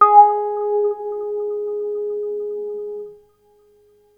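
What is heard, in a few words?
bass G#1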